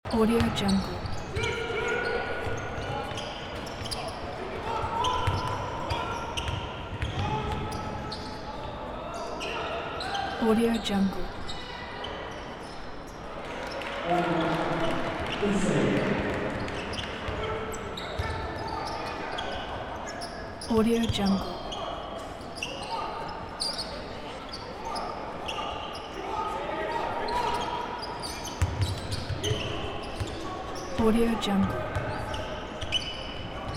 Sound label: indoors basketball match ambiance